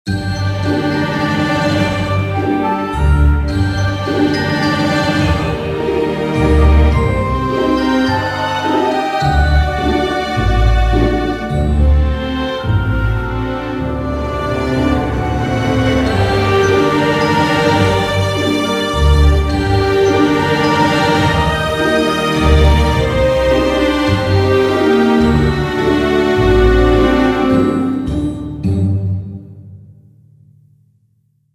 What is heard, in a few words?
jingle main theme; Haunted